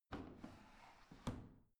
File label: washing machine drawer out soft